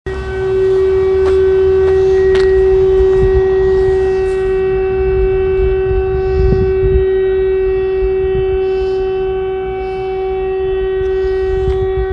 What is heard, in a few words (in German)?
Sirene Kramatorsk